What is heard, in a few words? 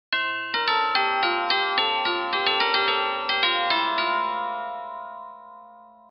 deck; new years puzzles